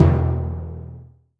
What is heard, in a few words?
timp E1, timpani; AKAI S6000 CD-ROM - Volume 5